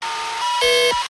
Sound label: alien fax; gregor quendel